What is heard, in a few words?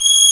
VIOLINS; E-MU Formula 4000 Series Vol. 3 – Analog Odyssey; Vintage Keys